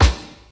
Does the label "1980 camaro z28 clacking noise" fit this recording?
No